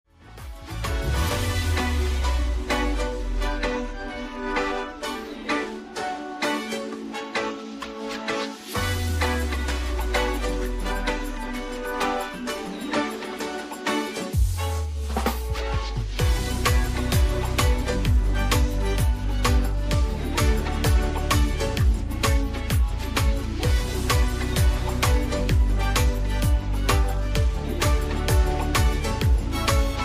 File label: Instrumental